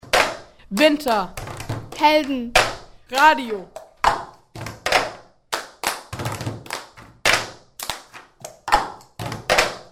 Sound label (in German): Jingle lang